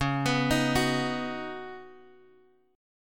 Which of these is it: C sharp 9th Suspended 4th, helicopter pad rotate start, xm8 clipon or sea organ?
C sharp 9th Suspended 4th